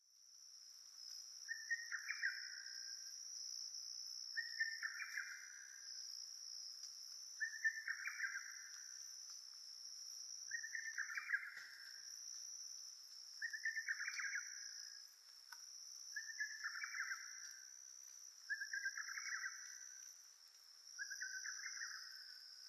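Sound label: hototogisu, lesser cuckoo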